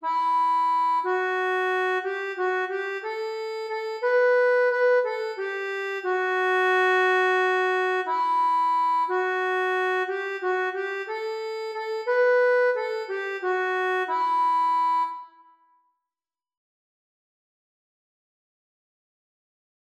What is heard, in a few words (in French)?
ACCORDEON